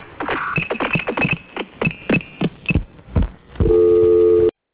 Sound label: cachink